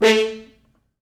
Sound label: Horns stops